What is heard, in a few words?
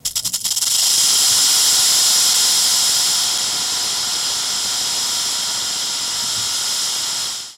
Rattlesnake Smooth, rattlesnake